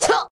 power throw ball

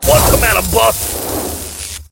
waterbot

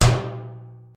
minigame tong

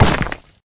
weapon land

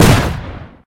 pung

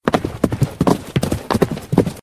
Horse run

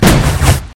pumpkin bomb

